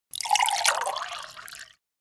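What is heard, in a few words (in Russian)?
вода в стакан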